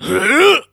voice attack

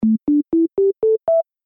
synth bell

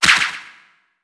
miss

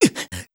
voice damage

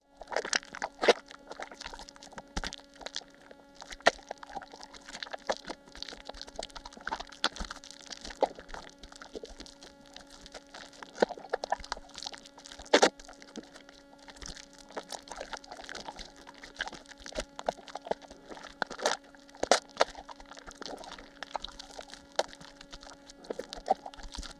squashy sticky loop

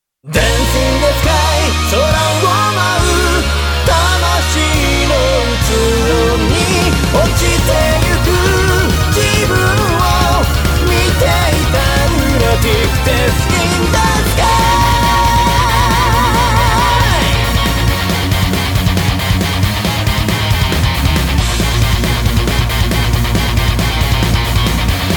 Lunatic vocal

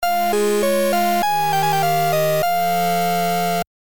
8bit INN

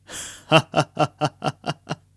voice laugh

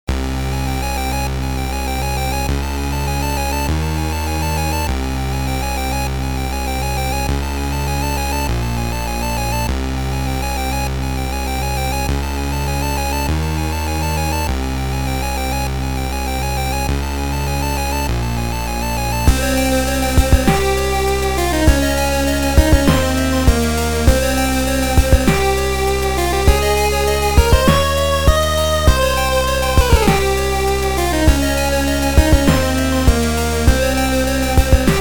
8bit Dungeon

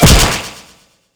damage

